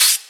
Hihat Open, House; Drums; Cymbal Stuff; Hihat Combinations

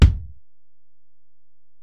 Ac BD, Alesis DM-PRO; 300 Drum Machines

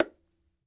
Bongo High, World Percussion One Shots; Sony CS

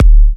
D kicks; markus hakala kicks

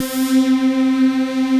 BIG FUZZER, DANCE; Pro-Rec

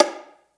Conga Slap; Groove Elements

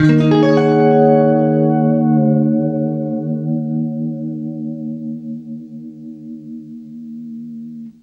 CLEAN D#M9, Guitar; East West; Steve Stevens Guitar Samples Collection

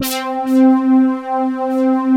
ATTACK PULSE, TECHNO; Pro-Rec - Synthsonic; Z1 TECHNO

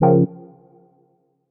stab beak F, Noise; stabs

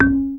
GAMBANG C#4, GAMELAN SET; Spectrasonics; Heart of Asia

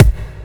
Ghetto Bd, Kick Drums; Zero-G; Soundclash

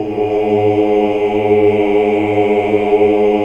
OOH. OOH UNI; Hallelujah

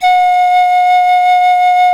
pan F 5, panflute; SoundBytes - Early Patches

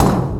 Clank, Industrial; Sonic Foundry (Sony Creative Software)

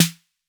808 snare; SNARES; Wizoo - Platinum 24 Electronic

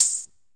Tambourine, Percussion

